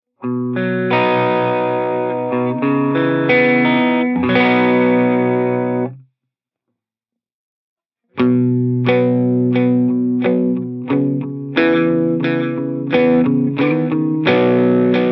Mod Panning Delay

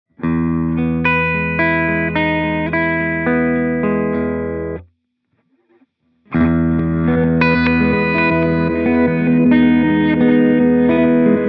Reverse Reverse Delay

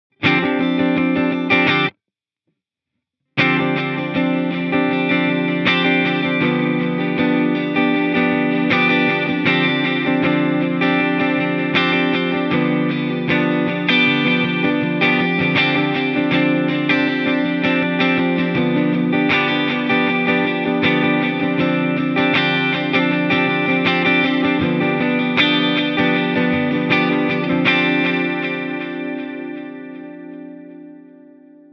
Digital Ping Pong

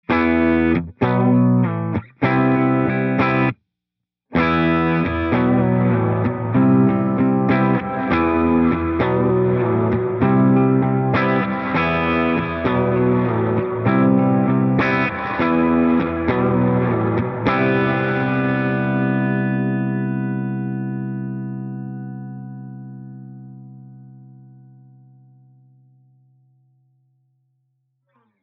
Reverse Decay Dark Swells, reverb

